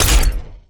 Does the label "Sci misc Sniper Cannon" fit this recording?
Yes